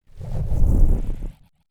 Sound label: whoosh synthesized low bass subtle; fuzz motion